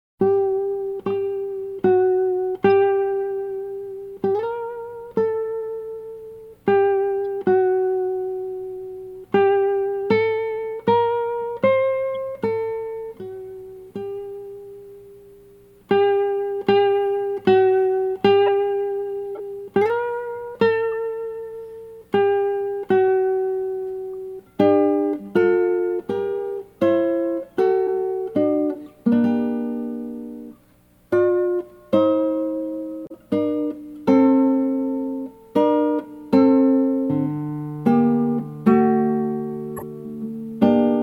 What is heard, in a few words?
guitar